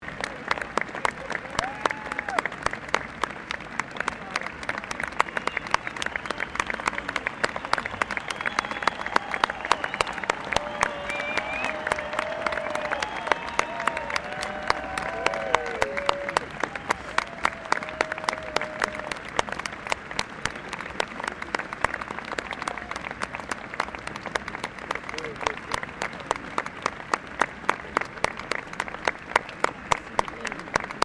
Crowd Large Outdoor Event Applause, Outdoor event crowd; Human